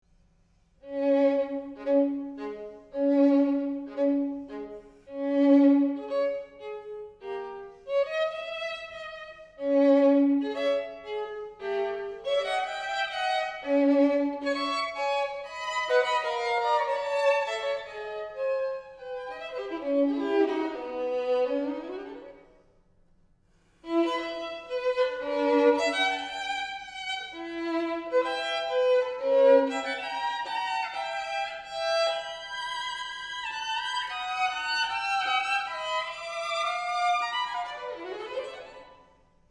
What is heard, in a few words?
Sonate Allegro Poco Scherz